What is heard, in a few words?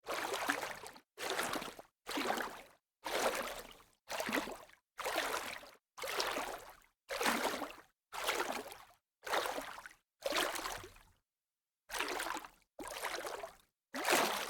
light splashing of water